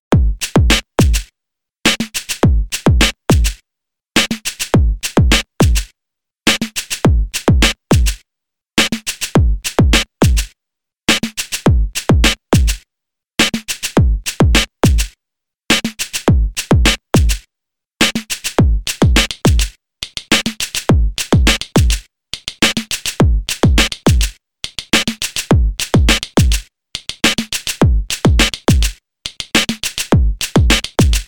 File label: beats loop track bpm 104